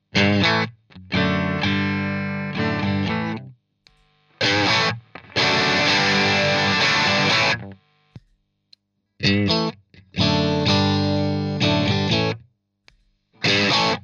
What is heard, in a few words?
Screamer Riff; JHS; CH1 postitiv 12 Tone 3 Gain all positions